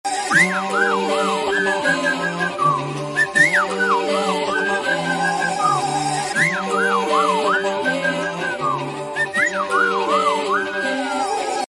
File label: whistle